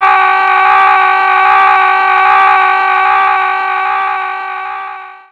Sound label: kamikaze scream; tf; noisemakers